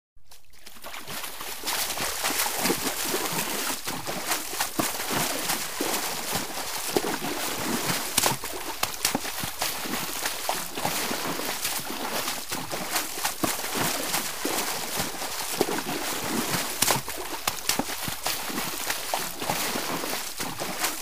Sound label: Splashing in the water